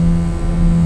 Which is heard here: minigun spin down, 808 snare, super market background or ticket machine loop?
ticket machine loop